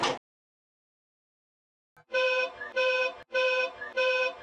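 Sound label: Emergency brake, Train; OpenBVE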